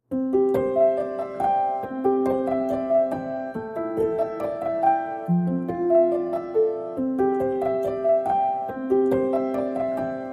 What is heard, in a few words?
Piano Only Mix